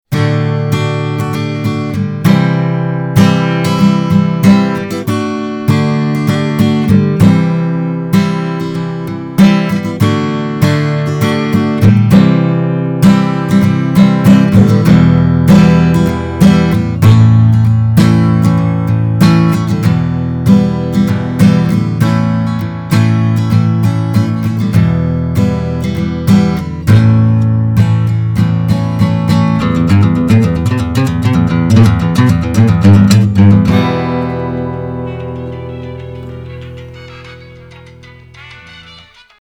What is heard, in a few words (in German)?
lagerfeuer mikrophon